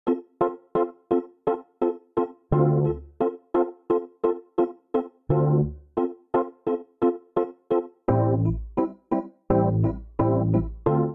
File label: D Minor 86 bpm; MIDI Clips; Tonal; Accompaniment; Rcrd Plnt; Ableton; Factory Packs; Chop and Swing